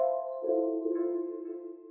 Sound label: Kinect Chime